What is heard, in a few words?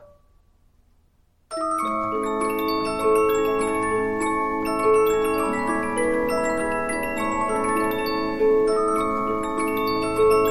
Polyphon, musicbox 15inch